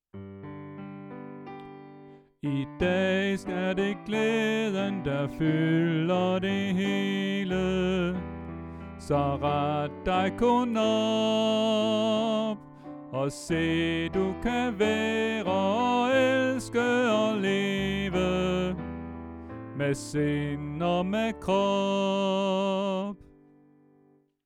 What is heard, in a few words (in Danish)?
Sopran; oevefiler